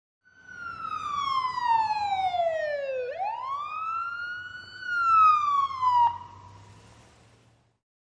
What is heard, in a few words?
Wailer Siren Short In And Stop Close.